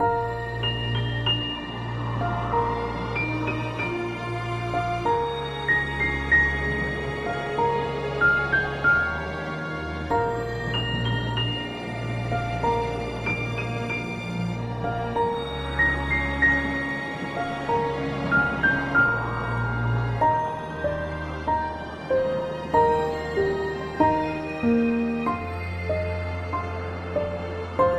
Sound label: winter background music